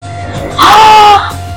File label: aaaaahn